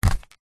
cardboard pierce pen

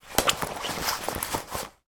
sport tennis racket cover sleeve movement; sound effects one

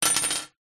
household key mortice set down on glass table; zapsplat

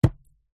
food onion set down on chopping board

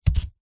foley foam board set down on hard surface; zapsplat

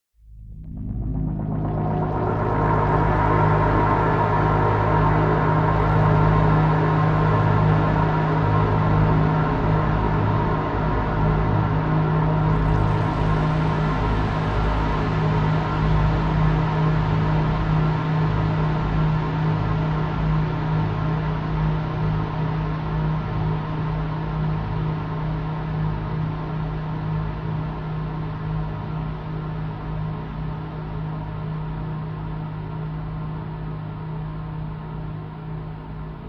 sound design drone dark eerie airy decay fizz; zapsplat

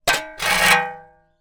industrial shovel scrape on concrete; zapsplat

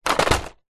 plastic hollow large fall over drop; foley

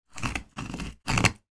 ice chunk scratch engrave surface with chisel; sound effects one

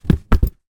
transport motorcycle helmet down on ground

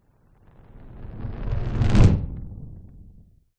nature fireball pass by large designed

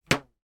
rubber band twang; foley

